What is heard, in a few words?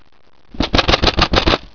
Wing